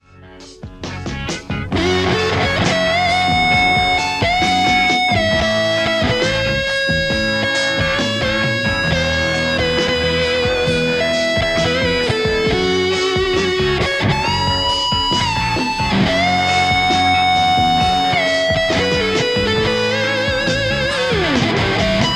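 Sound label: Stratocaster; tse x50 b3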